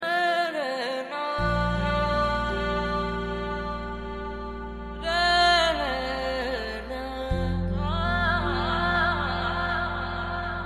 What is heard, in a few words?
Classical Music